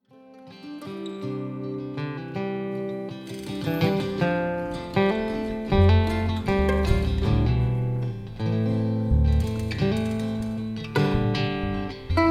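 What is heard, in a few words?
Mandolin Warmup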